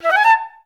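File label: flutes A4; Best Service; Peter Siedlaczek's Advanced Orchestra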